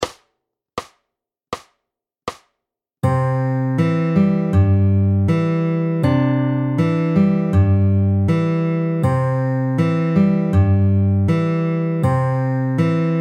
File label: In Betweens SLOW